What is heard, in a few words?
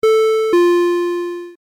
Interphone